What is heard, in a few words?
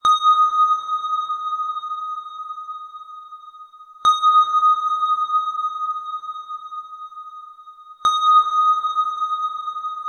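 sonar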